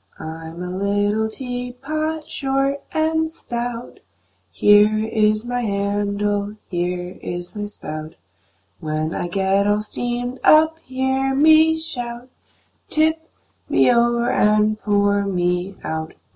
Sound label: little teapot, Nursery rhymes